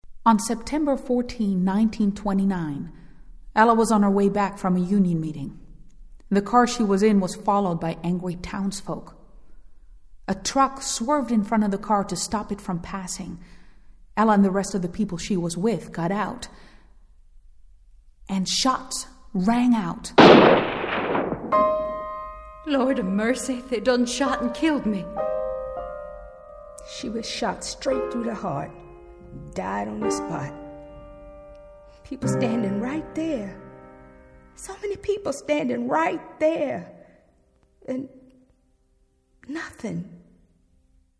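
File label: Shot underscore with shot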